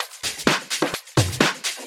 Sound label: Electro Loop 128 BPM; Vengeance Samples